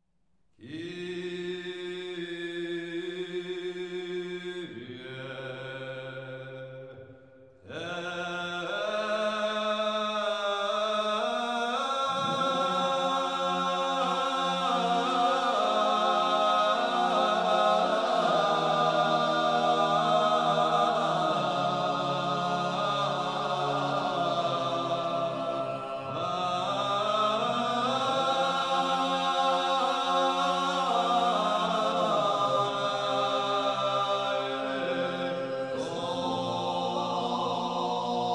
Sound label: chant corse kyrie eleison; Ambience; organum; MAxMusiq